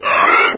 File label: stalker alert; npc; source engine; episodic